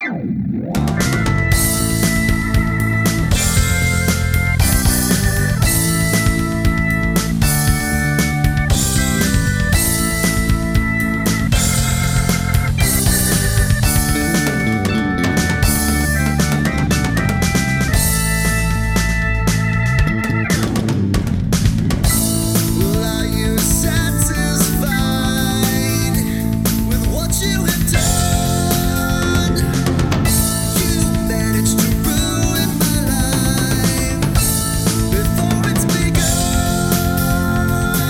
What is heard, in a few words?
male keyboards bass; music smorgasbord